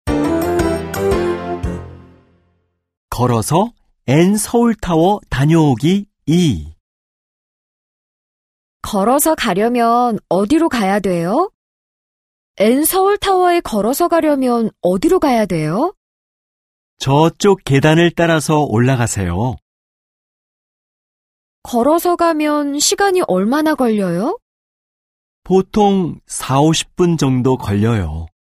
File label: K-POP